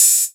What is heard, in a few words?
OPEN HAT, one shot drums; App Inventor Media